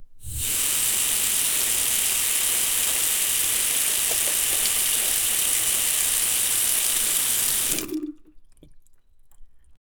soft running water from a, sink

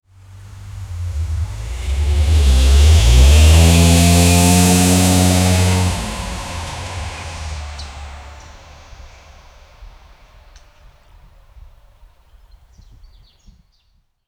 3 mercedes come in in, arrival

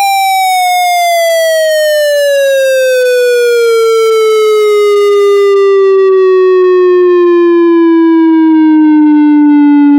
minimalist tragic outro; instrument

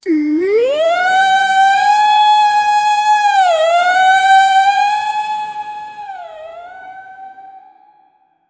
spiritual hindi mantras

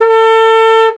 ford mustang 1964 single, vehicles

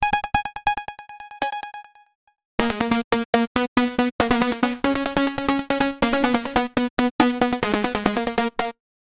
anime chakra sound; gliding

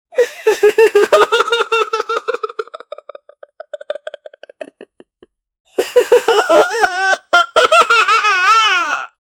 boy crying funny

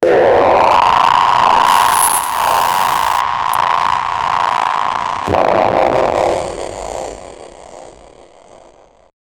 goo sound, slime

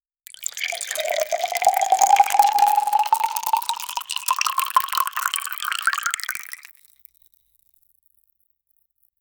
gentle liquid pouring

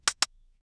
cricket bat knock

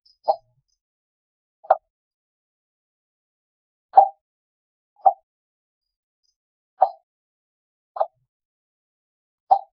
pushed button, user interface

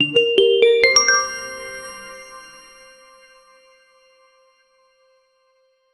reward success sound effect; positive

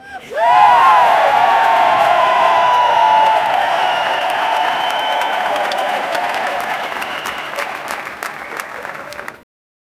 arena crowd clapping; cheering

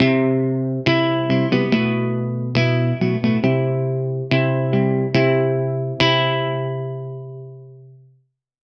midwest emo electric guit

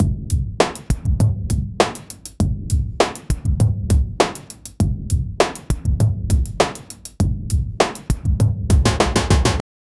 a chill drum and bass

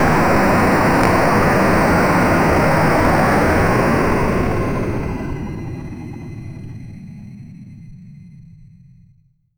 create an 2d game pixel, pixel art

